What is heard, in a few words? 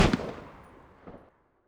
sniper; weapons